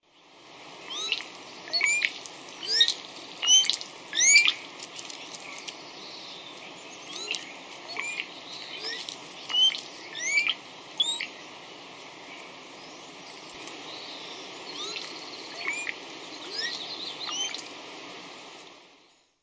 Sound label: Bronzed Cowbird